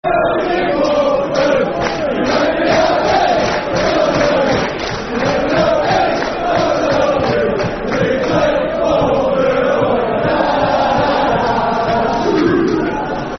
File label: You Are My Watford Football Chant Soccer Song